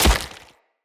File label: Husk Impact Flesh, Unarmed; Weapon Impacts; Enemy; Mockery Husk